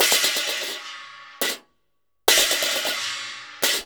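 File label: CHINA, China cymbal; Spectrasonics - Heart of Asia CD2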